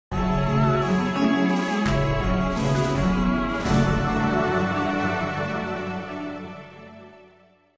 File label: gameover; hydracastlelabyrinth; midi